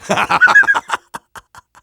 happy laughter; engineer

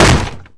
crab anger

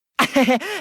giggle; kzjoutarou

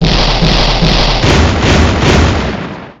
train damage; paynetrain

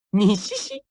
nishishi, jap; kokichi

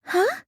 "huh"; sayaka

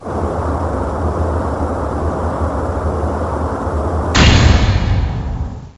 desk slam

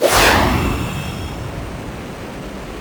stomping; sonic

